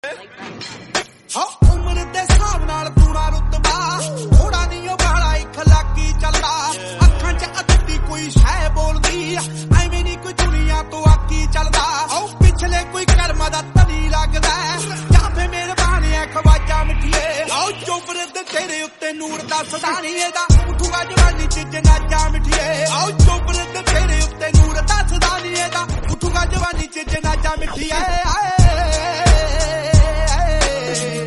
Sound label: punjabi song